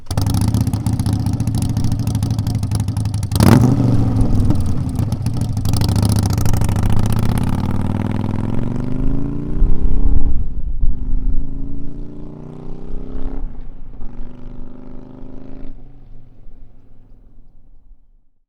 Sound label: Sportser 1200 3 Slip-Ons Take Off; Exhaust Sound - Rinehart Take Off; 2014-2018